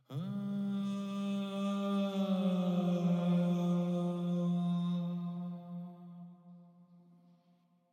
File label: vocals back automation